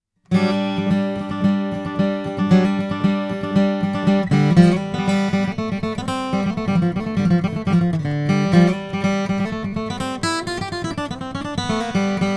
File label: froggy bottom h12 limited custom; 1998